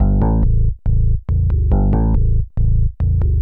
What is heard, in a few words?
TECHNO BASS 140 A